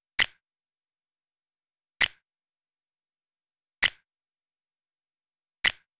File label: Drum Loop 132 BPM Snap; Loop Stems; Cymatics; Vibes II Drum Loop Collection; Vapor